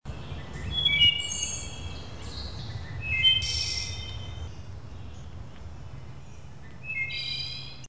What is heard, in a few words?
woodthrush short, bird sounds; Aves